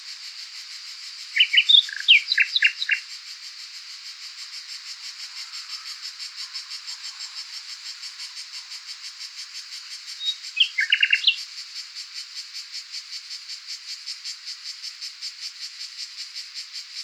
call, Nightingale, hesitant syllables, Background: noise from cicadas.; July is the end of the singing period for the Nightingales in Southern Tuscany.; luscinia megarhynchos